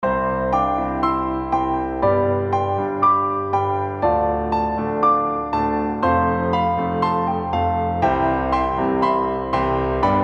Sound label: All Parts At Tempo 120 BPM